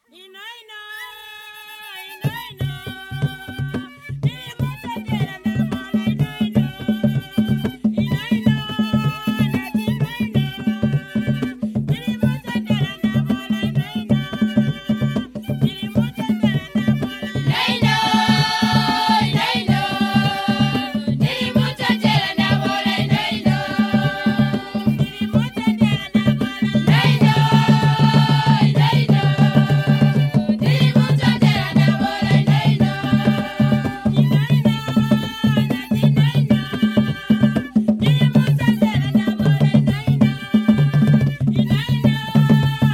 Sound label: zambia